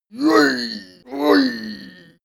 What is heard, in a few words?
male cheer playful; koerpergeraeusche; menschen; soundpool